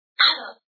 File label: beast sound; Caravan Beast